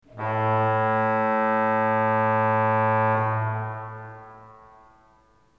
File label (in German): ton, orgel